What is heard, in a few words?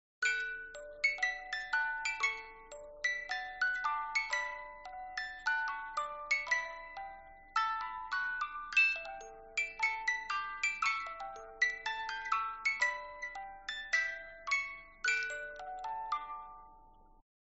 موزیک باکس